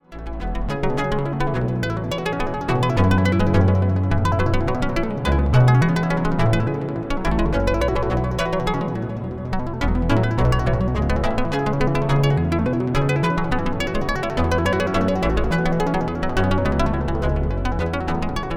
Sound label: pitch Mod cv, 32 Voice String Synth Eurorack